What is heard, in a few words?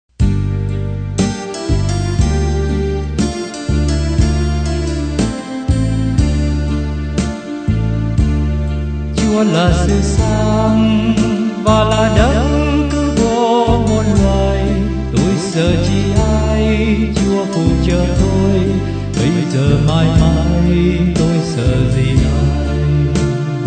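soprano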